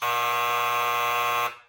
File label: buzzer; britains got talent